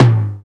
Linn Drum, digital; 90 s Sample CDs; Sound & Vision - Gigapack I CD 1 (Roland)

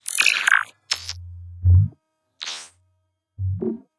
scraper

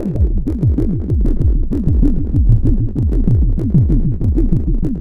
Synth Bass; Bass X

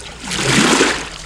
ROW, Boat Rowing; Water

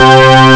church; Amiga Soundtracker Sample Packs

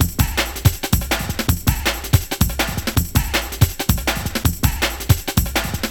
162, Jungle Breaks; Retard; Jungle Warfare 1&2

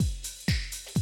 125, Classic Drum Loops

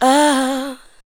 ADLIBS 90; Ueberschall; Jam Box

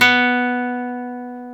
GTR nylon

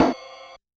Realistic Concertmate-670 Rhythm Snare Ride; Rhythm Layered Kit

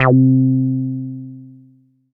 Oscar bass

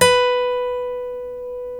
STEEL B, FOLK STEEL Guitars; Spectrasonics; Hans Zimmer Guitars VOL-1

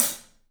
Paiste hat, drums; 3D Sound

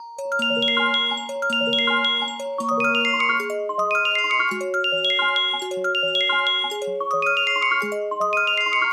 mel; fiveRains

